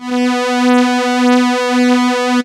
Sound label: Strings midlow; instrumente